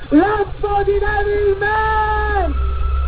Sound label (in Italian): lampo